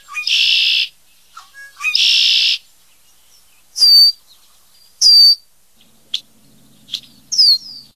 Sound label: blackbird; stamps; animals; birds